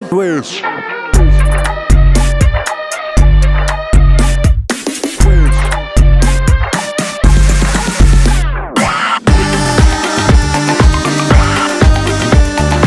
Instrumental; Love Aaj Kal

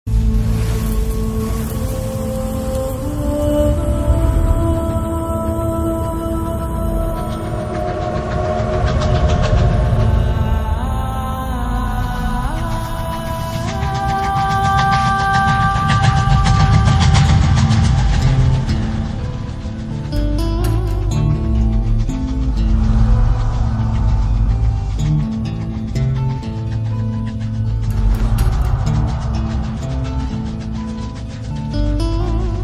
Beats; Radhe Shyam

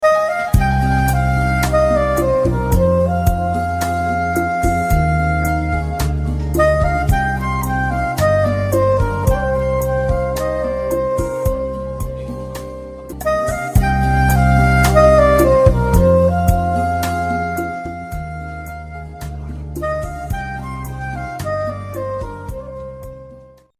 Flute Version Ringtone

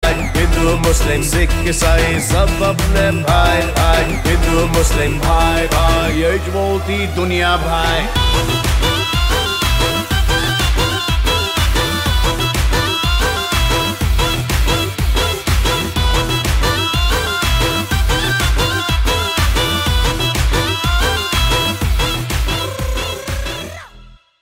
Instrumental and Lyrical

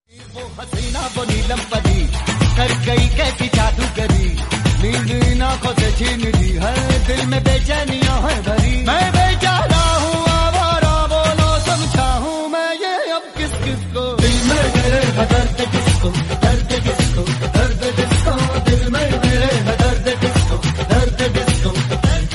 Disco ringtone

